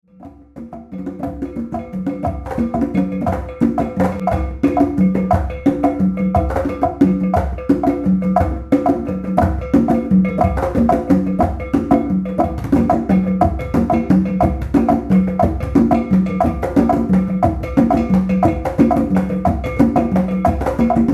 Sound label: Rumba Columbia